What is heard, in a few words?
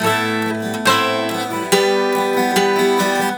GTR, Celtic Flavours; USB Soundscan vol.42; AKAI